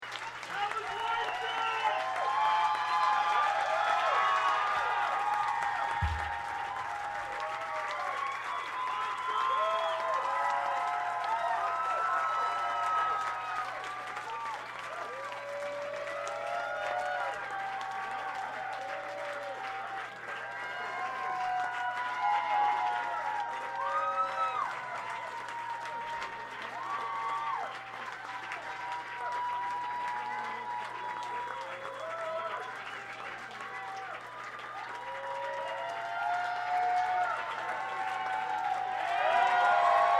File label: encore break